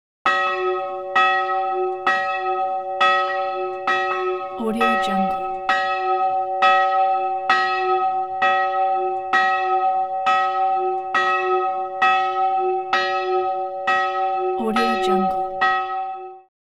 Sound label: monastery bell tolling with light birds; hollywoodedge